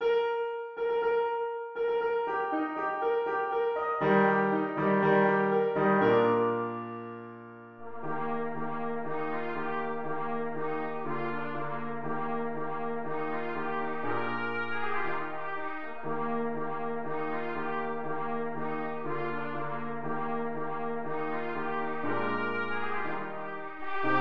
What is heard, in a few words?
trumpet